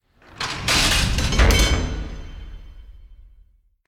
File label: Door Unlock; Asbourg